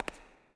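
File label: reverb walk, footsteps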